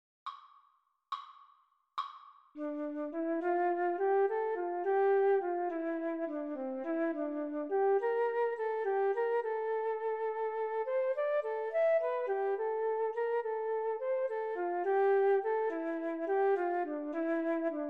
melodic error detection

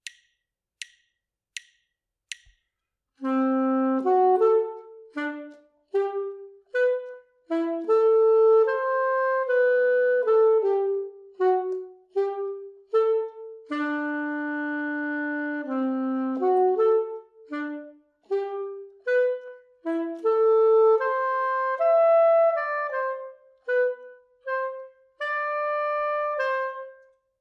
melodic dictation; eartraining

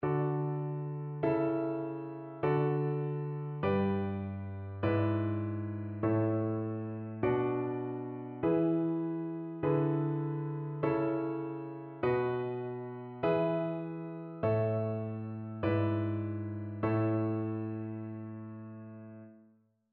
harmonic error detection; ear training

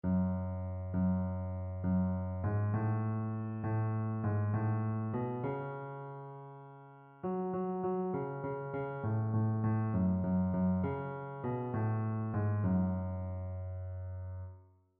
mode identification

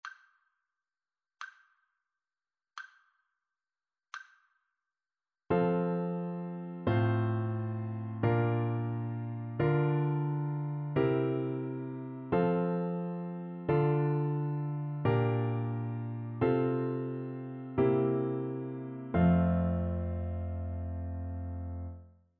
harmonic dictation

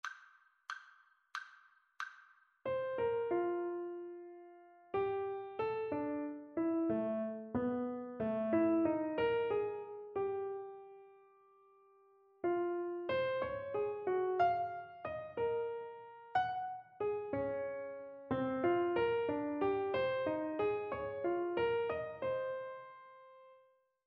melodic dictation; ear training

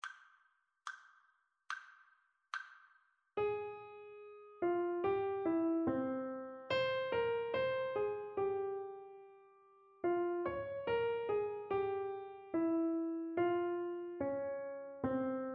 partially completed melodic dictation; ear training

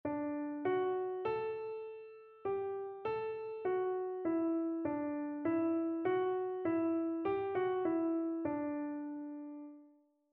melodic error detection; ear training

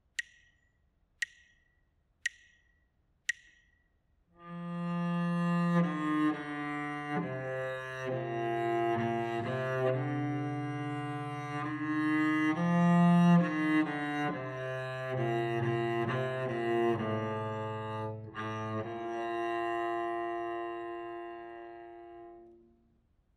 melodic dictation; eartraining

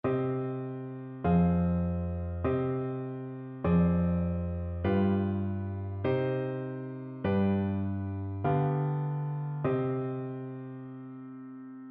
harmonic error detection

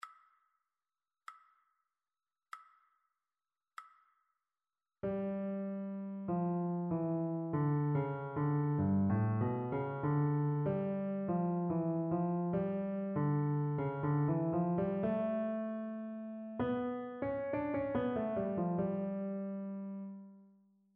partially completed melodic dictation

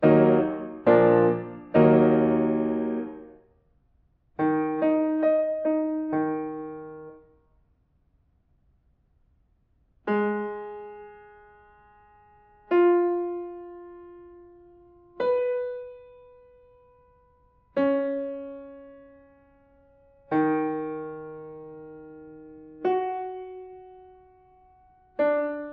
diatonic collections

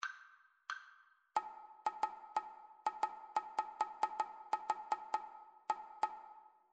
rhythmic dictation

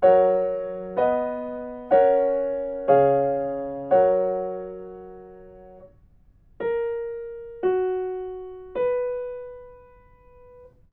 melodic fragments; ear training

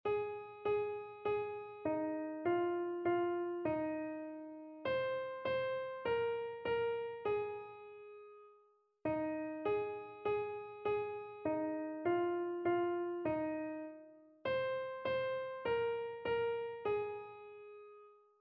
mode identification

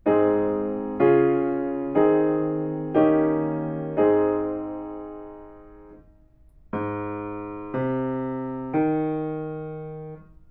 melodic fragments

